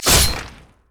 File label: basic attack sword; rengar